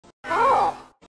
hurt sound effect; omega flowey

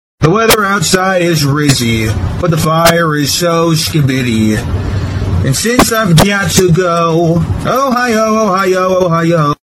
bass

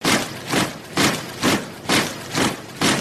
soldiers marching; soundbible

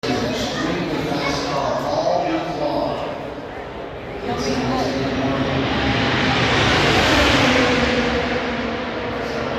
indycar passing

